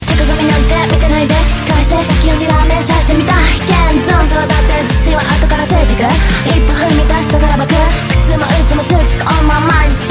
love rap ringtone

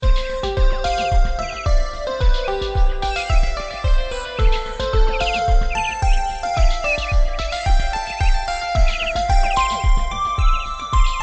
goodluck ringtone; nokia ringtones

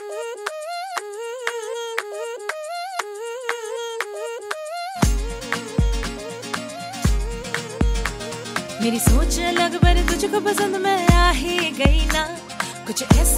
hindi ringtone bollywood ringtones

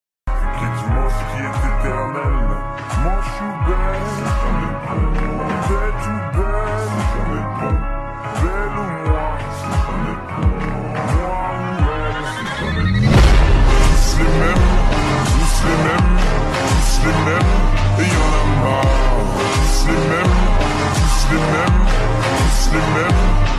slowed audio ringtone; english ringtones

